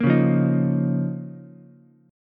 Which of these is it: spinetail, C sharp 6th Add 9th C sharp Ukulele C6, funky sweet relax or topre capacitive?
C sharp 6th Add 9th C sharp Ukulele C6